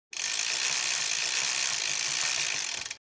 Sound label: Bicycle freewheel spinning